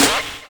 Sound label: RAIN BEAT; Univers Sons - Kitch 70s